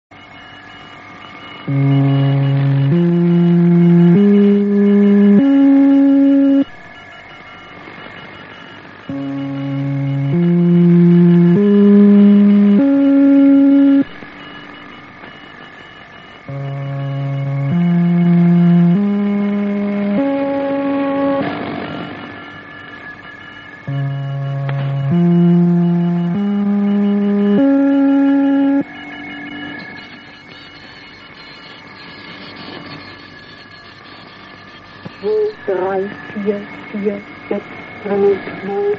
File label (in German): tonleiter; radio tv historisch